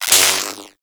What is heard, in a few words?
Lightning Rifle Spark; Warframe; Corpus